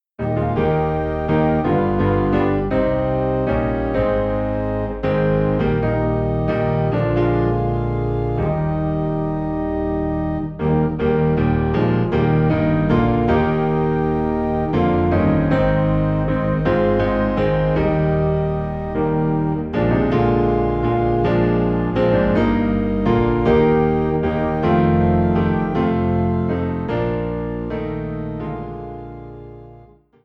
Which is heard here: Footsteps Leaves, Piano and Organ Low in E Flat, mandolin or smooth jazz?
Piano and Organ Low in E Flat